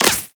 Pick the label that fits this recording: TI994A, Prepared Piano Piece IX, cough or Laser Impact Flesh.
Laser Impact Flesh